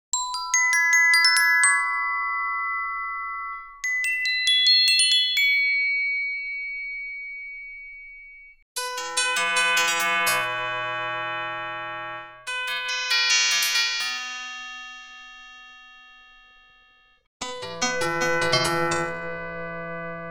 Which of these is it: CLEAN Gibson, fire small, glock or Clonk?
glock